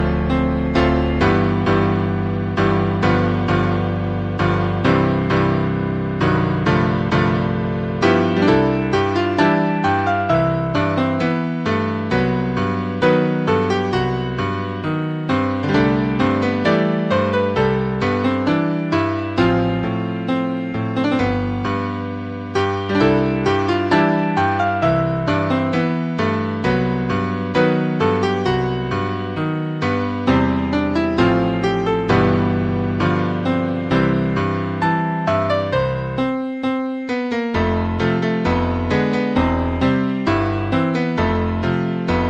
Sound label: background; sound of music